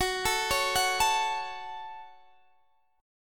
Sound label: F sharp Minor, Guitar Open D; chordshape; down